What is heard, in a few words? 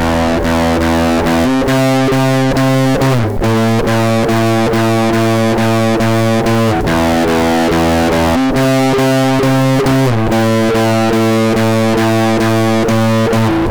fx dist